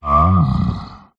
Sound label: horror yeti monster groan happy content; zapsplat